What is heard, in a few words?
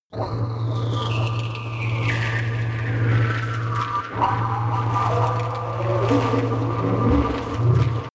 sci-fi flutter; soundpool